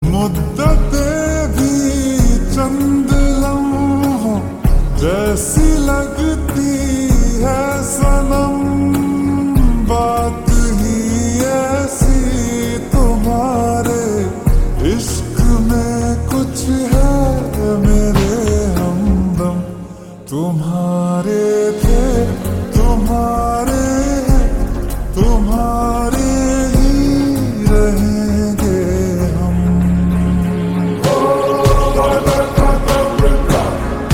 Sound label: Slow Reverb Version Ringtone; Stree 2